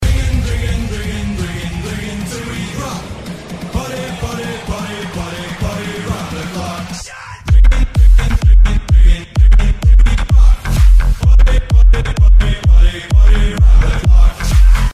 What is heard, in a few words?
party button